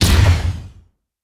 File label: Sci Fi Explosion